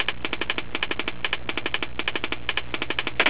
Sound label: type